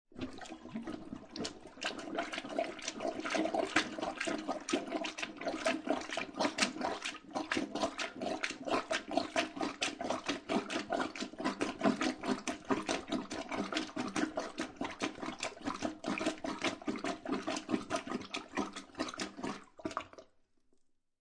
Toilet Plunger Plunging, Household, Toilet Plunger, Plunging Toilet; Bathroom